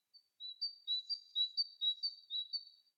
Bird Singing Spring; ANIMAL; mono; Universal Sound FX

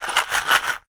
Box of Push Pins Shake; Office Supplies; 1001 Sound Effects

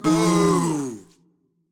Crowd Boo; Pitch Black Audio Pong

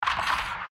gate close; Code7

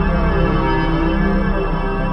Teleport Beam Loop; SFX Kit; Sci-Fi Loops

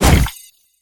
Abomination Melee Magical; Kilta

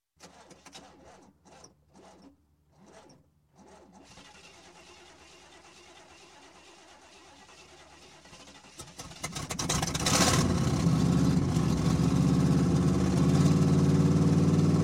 Bad Car Exhaust; Sound Ideas; Series 2000

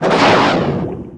Torpedo Shot, Weapons

